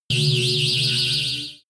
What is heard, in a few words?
extrinsic melody; energie